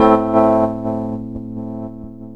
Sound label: RHODES; E-Lab; X Static Goldmine 2